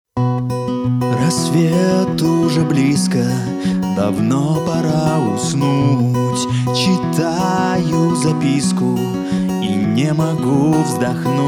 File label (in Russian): vokal